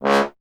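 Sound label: bass trombone C#; Miroslav Vitous; Solo Instruments VOL-1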